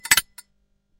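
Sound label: cocking the hammer click, musket; zvuk mushketa; kurok vzvodim schelchok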